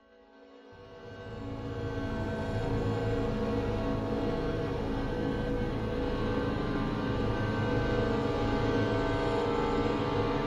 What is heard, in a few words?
Braaam Trailer